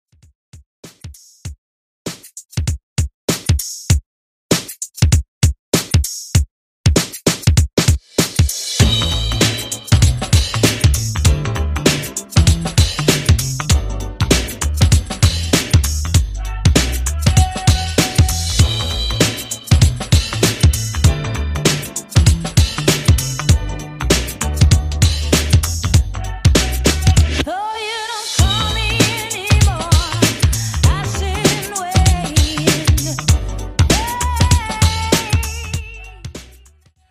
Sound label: 90s Redrum 98 Bpm